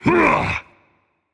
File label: grunt jump rage; chaac